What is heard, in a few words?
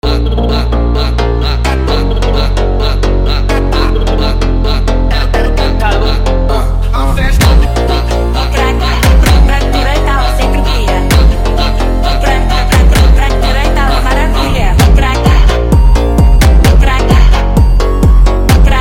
phonk brazil brazilfunk brazilphonk